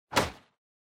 Motion Grab Gentle

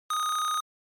NES RPG Message

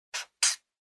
Felt Tip Pen Check

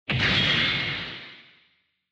Retro Anime Robot High

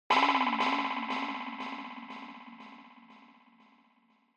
Onoma Sigh Delay

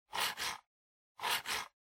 PC Mouse Move Short

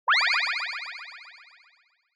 Motion Pop

